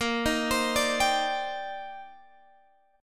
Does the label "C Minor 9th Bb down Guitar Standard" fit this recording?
Yes